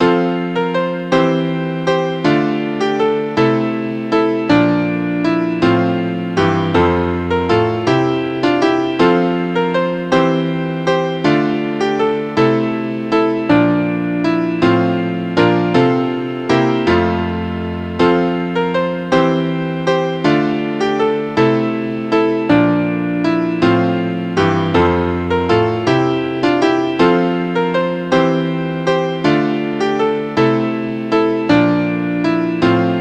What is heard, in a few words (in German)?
pastorale klavier